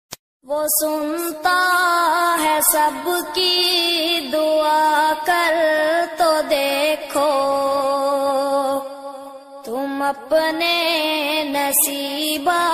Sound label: Ringtone Naat Ringtone